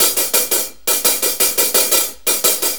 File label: 4-4 HiHat Loop; Sonic Foundry; Drum Tools